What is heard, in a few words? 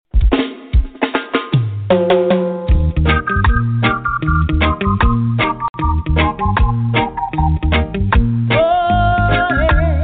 reggae rock show; early morning wake up